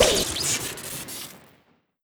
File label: discipline device woosh crit, weapons; tf2classic